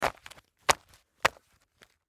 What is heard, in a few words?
Pamphlets Stack Neatly; Office; Blastwave